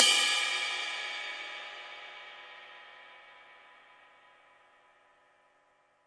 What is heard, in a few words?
real long ride; REAL LIVE KITS; A Real Freakin Drum Kit